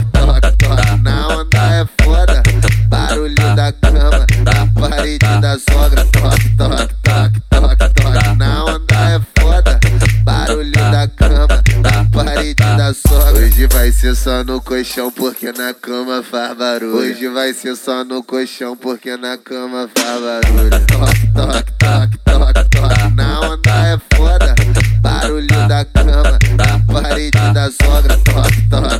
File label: funk bass